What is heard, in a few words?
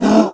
ogre hit; Crypt of the NecroDancer